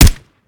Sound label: mike4 sup, weapons; gmod; viper